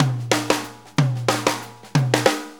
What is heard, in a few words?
Shuffle Fill, Drum Tools